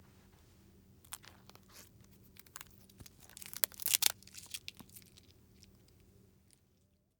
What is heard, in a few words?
Packaging & Wrappers; TheWorkRoom Audio Post